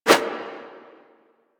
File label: Sticky Bomb Idle Light Whoosh; Grineer; Veilbreaker; Vor